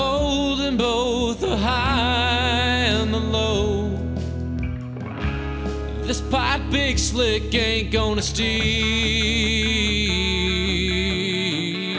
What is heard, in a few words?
noisy, vocal mix